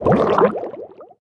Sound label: drown, player hurt; Minecraft; Votive World